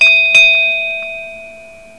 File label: SHIP BELL